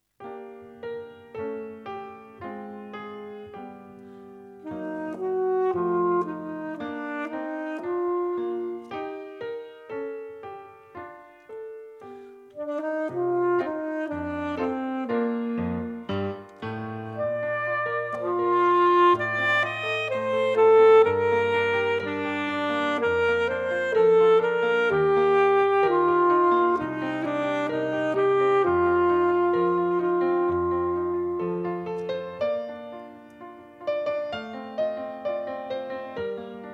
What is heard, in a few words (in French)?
sax